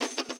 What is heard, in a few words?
PERC, Closed Hats